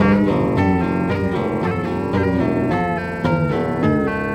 Gtr Drm 100bpm

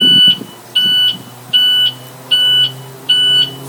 Forklift Backup

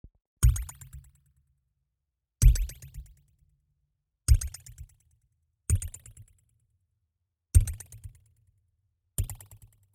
Cute Level Up

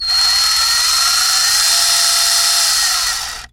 Drill

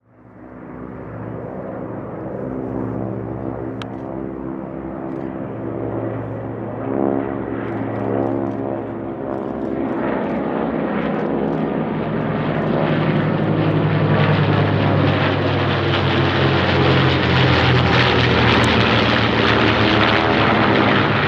B 25 Mitchell P 51 Mustangs T 6 Texans

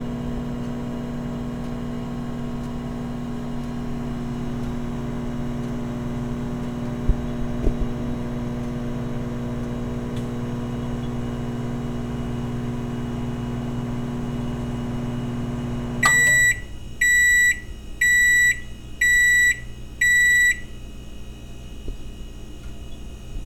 Microwave Beep

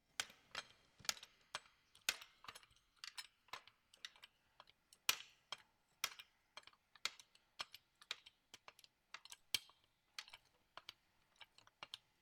Cranking A Car Jack

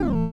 Retro Falling Down

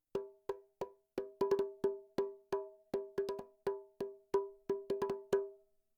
Bongos

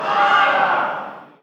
Goal

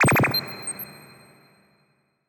Magic Sound

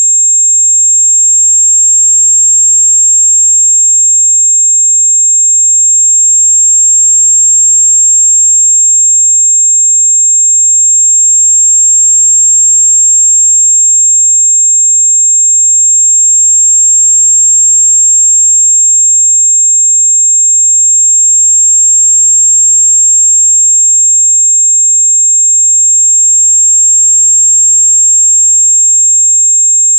Ringing In Ears 7500hz

